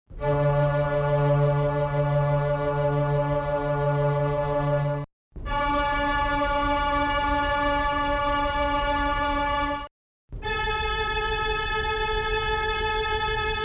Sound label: mellotron